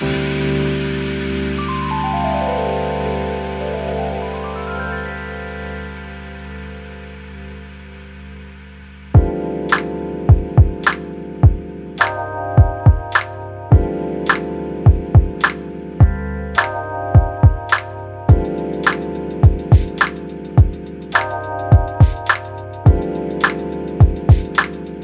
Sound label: One Shots; Digit Sound; Atjazz